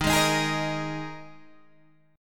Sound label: D sharp Suspended 4th, Guitar, Standard; strummed; chord; 11,11,13,13,11,11; JGuitar